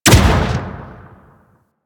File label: Spear Shot Hit, Weapons; Warframe; Tenno; Priest Spear